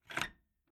Household Old Door Bolt Lock Locked Open Slowly Metal Squeak; Creaky Door